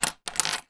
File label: foley money coins x3 australian down on table; zapsplat